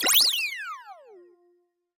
Restart Flag Get; SM3DL

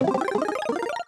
Power Up

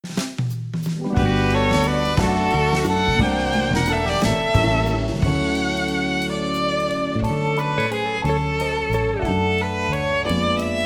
Underwater BGM Arrangement; NSMBW

